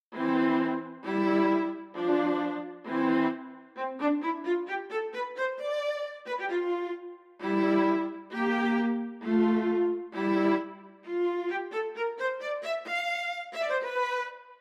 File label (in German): Duo Es Dur für Viola und violoncell; Duett mit zwei obligaten augengläsern; Solo